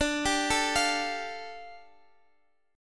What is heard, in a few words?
A 7th Suspended 4th Sharp 5th, D